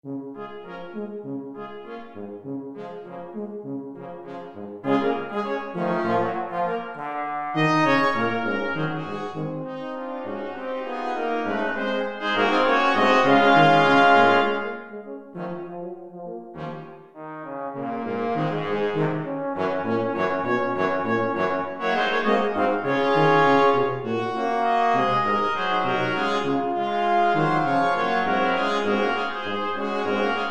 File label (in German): blechblasquintett